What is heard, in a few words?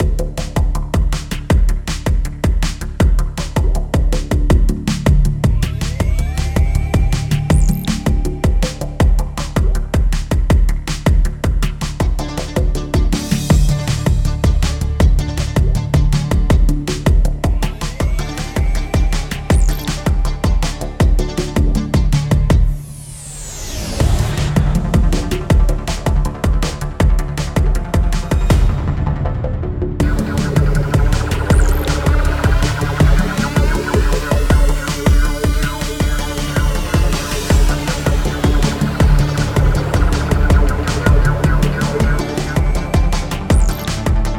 Race music; Midnight Legends